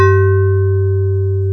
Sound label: VIBRA; East West; Global Sounds